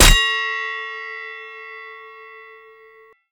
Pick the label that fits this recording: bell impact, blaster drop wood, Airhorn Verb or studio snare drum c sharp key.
bell impact